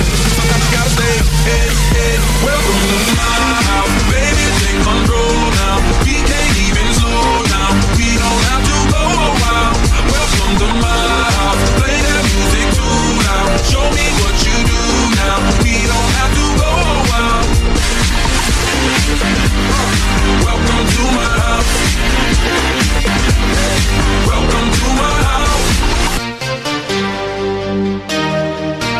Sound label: House Dubstep